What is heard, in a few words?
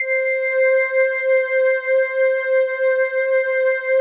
PAD, Pads; Best Service; XX Large